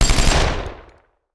Machine Gun; Sound Ideas; Guns

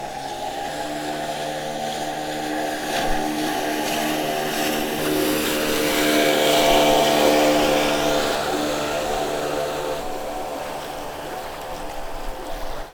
boat motorboat small; best service Blue Box; sea traffic

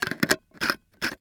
Specimen Jar Close Lid; Medical; Blastwave

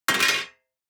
DICE on Metal, Throw and Roll, Metal, 1 One Die; Samples; ArticulatedSounds; DICE Sfx Party (Extended)

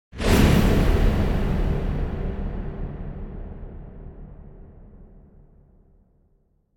Impact Whoosh High Metal Heavy - Long; Hollywood Edge; sound designer's toolkit v2

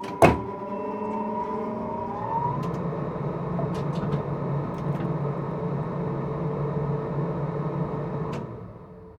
tram still; best service Blue Box

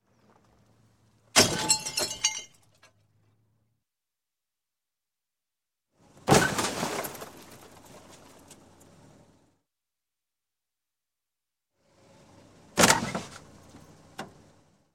Crash, Auto Small Head On With Glass; Sound Ideas 2000 Series

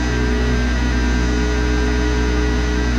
Machine Generator Loop; SFX Kit; Machinery - Generators

